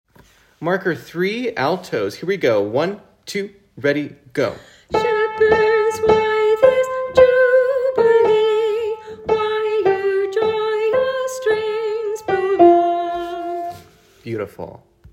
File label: Alto, choir